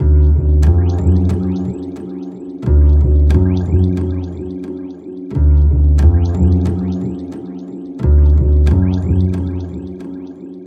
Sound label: Remixed Acoustic Bass